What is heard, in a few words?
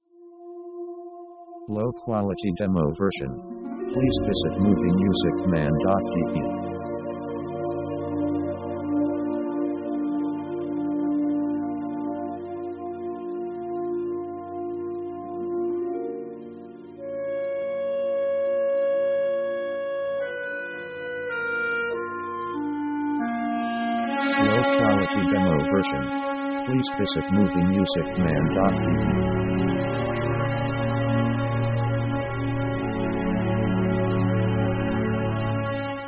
orchestra romantic; orchestra themes